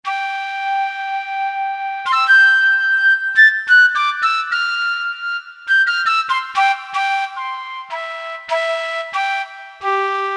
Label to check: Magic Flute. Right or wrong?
Right